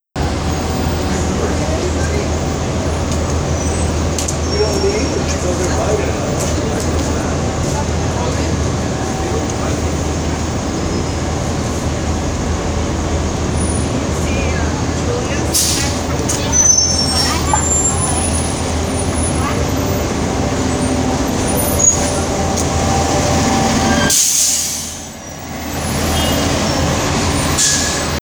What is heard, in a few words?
Passenger chatter and heavy brakes